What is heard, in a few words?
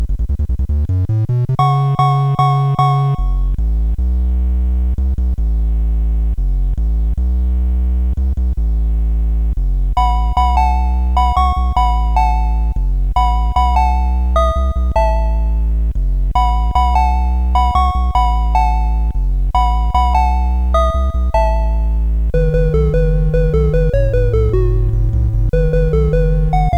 Subbass; CSG8580R5; hvsc